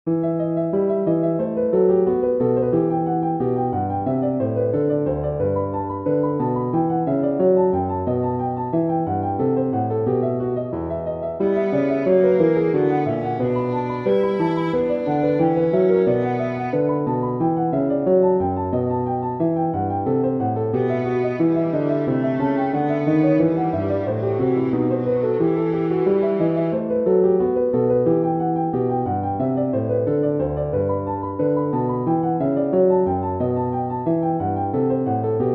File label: for viola piano; bach johann sebastian; aria jesus christus gottes sohn